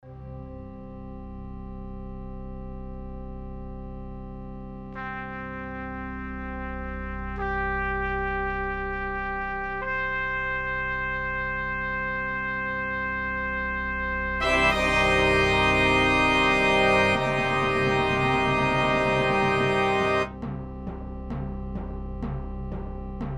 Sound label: organ and brass